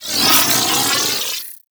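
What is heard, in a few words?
Missile Swarm Projectile By; Warframe; Corpus; Corpus Railjack; Spec Ops Arachnoid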